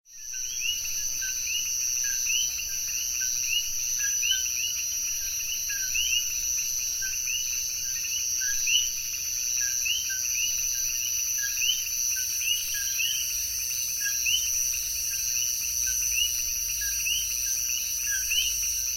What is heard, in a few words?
Coqui Call; night; The temperature drops as night descends on the Meädow. A slight mist envelopes the edge of the woods. Low croaks are; Bioacoustics Expert to Bring Amphibian Eroticism to the Meädow; Töad Meädow